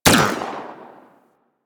Laser Fire; Warframe; Venus; Venus Terra Moa; Weapons

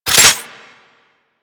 Reload, Weapons; Strun Prime

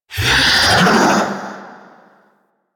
Wraith Reaper Vocal Attack; Warframe

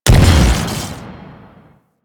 Shockwave Grapple Explosion; Warframe; Venus; Venus Terra Shockwave Moa; Abilities

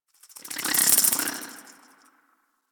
Tree Critter; Ambience; Props; Warframe; The New War; Zariman; Quests

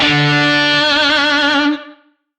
Shawzin D; Warframe; Lizzie Shawzin

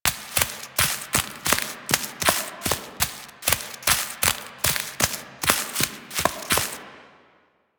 Horse Steps Walk Loop Vegetation; Vehicles; Duviri

